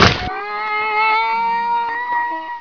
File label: bullchicken die